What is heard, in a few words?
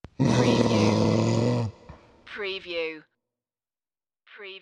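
Ghostly Ah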